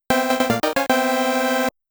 Round Clear MUSIC; Motos

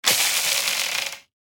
cowboy spin

